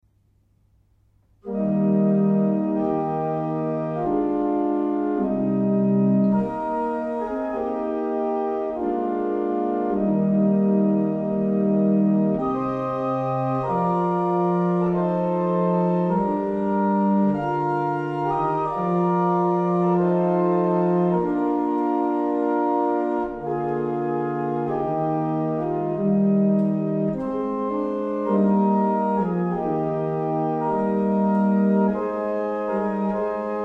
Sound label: barrel organs; Faulkbourne